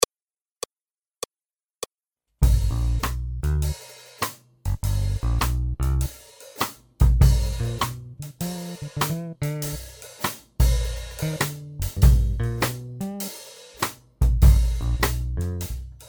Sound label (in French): Accompagnement seul